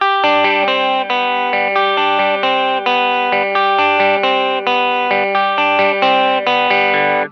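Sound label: ringy guitar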